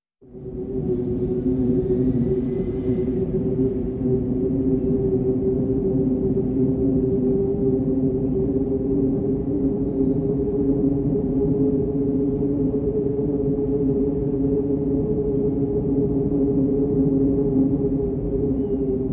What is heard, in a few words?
Wind